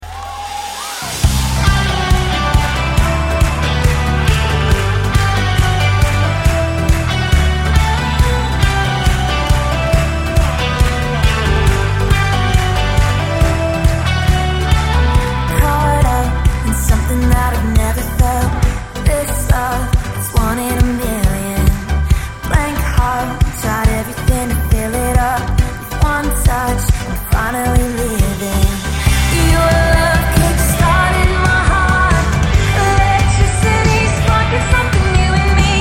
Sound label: vox female